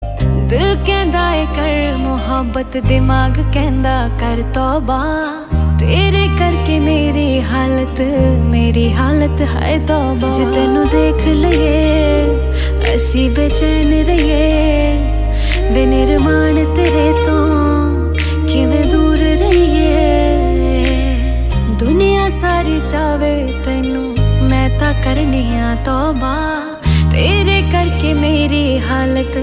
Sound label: Punjabi Song